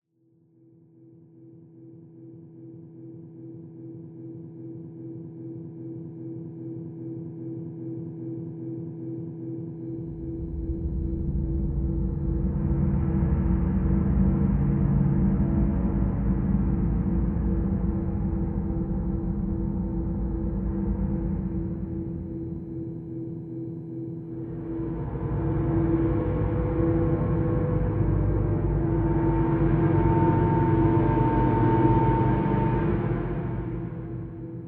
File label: Subway Gas Mask Explore Mood